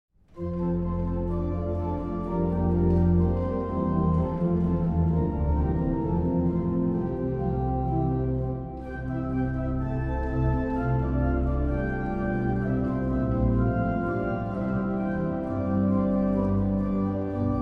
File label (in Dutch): Improvisatie (hoofdorgel en trompet); Ik heb de vaste grond gevonden